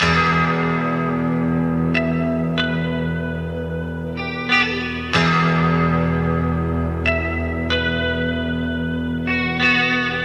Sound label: guitar, Loops, Sad